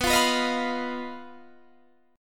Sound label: B Major Flat 5th B Guitar DADGAD